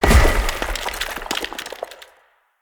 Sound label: Fey Turn To Stone Loop End; Powers